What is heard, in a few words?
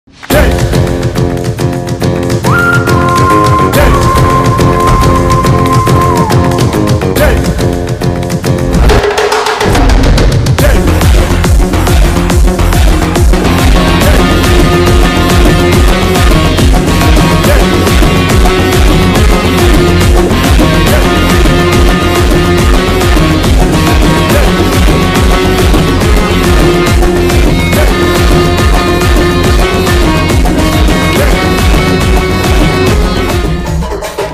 bass boosted climax bgm; dj tillu square; siddu; anupama parameswaran